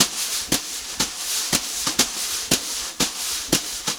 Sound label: 120 JZ BEAT, Brushed Drum Loops; USB Soundscan vol.39; AKAI; JAZZY